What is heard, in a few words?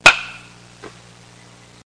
homerun baseball game